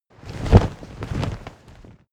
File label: flag large flap